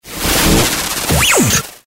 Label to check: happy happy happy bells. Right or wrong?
Wrong